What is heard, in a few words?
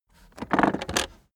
car accessories armrest open soft; autos; verkehr transport